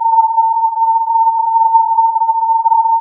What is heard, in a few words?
filtered input